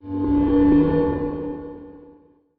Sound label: crafting ui disappear; combine machines; Half Life Alyx